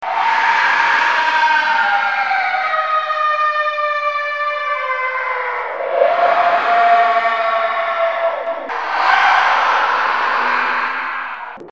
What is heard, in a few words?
ghost